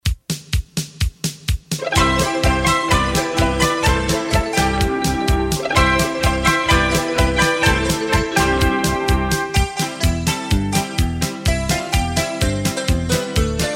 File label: music